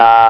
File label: ahh; wow